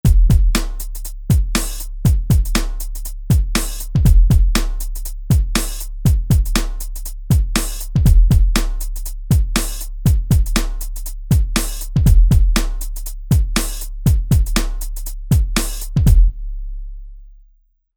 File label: Rap Hip Hop